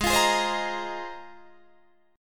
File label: Ab 6th Add 9th Ab, Guitar, Open D